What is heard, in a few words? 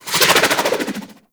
SMG Projectile By A; Tenno; Sundial